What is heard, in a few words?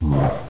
ZOMB; THE REALM